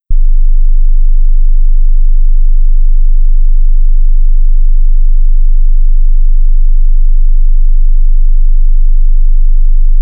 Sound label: 25Hz